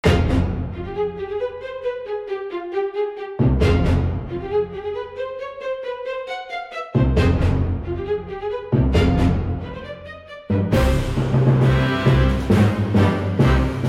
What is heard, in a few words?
Orchestral funny moment; the grand nothingness; meme